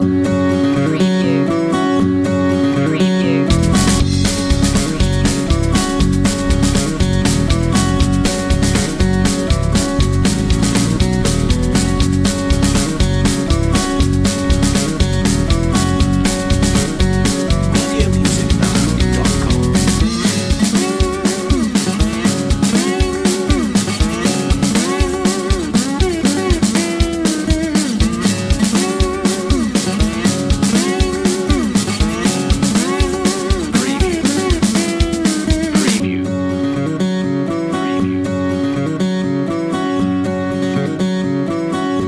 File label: cowboy song